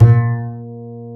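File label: double bass A#1, Jazzistic; Univers Sons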